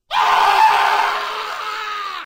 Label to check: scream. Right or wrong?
Right